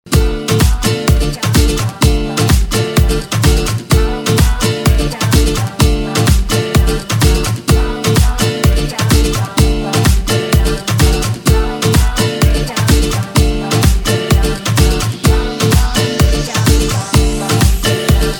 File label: Classic Party MashUp